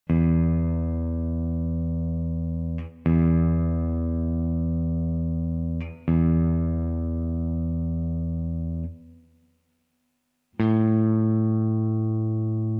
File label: Tuning; Frameworks Volume 2